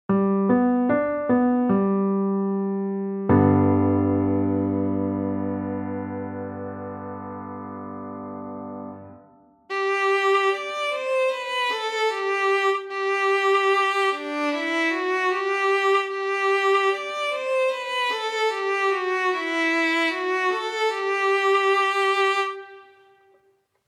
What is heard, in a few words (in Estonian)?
Viiul; g-duur